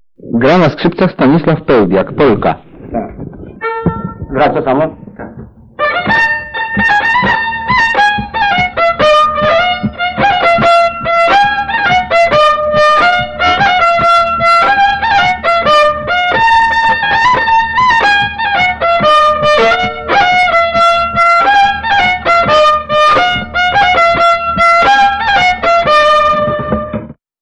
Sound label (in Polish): Polka, skrzypce; Golcowa; nagr. Bogusław LInette, 1964